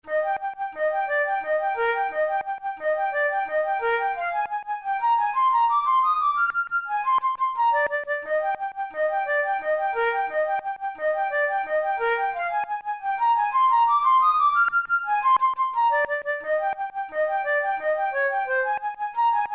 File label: study in e flat major, flute; kohler op33 no1 study no12